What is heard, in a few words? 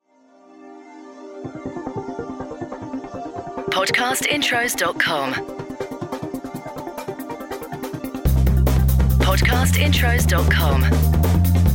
Podcast Watermark DRUM N BASS